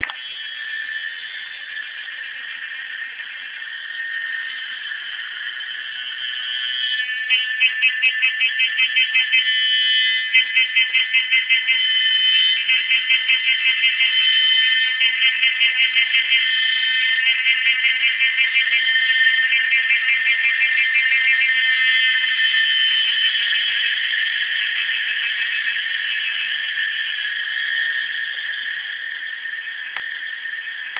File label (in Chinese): 台灣騷蟬, 蟬的叫聲; 生物多樣性; 生物多樣性教學設計